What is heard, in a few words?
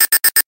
warning Radar Beeps; AsteroidPatrol3D